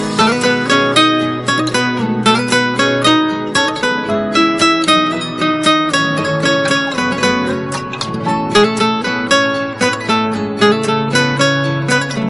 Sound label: Ringtone